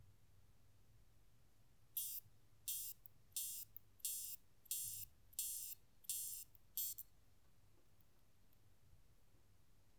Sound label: isophya brevicauda, 25,7 Grad; stmk Gleinstaetten Untergreith daheim; MSehnal